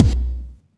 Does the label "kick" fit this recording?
Yes